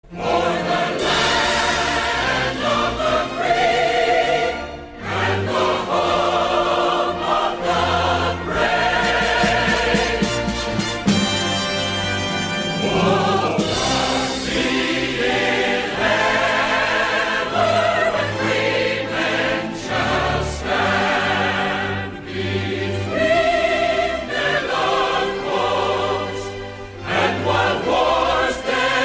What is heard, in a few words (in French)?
Hymne americain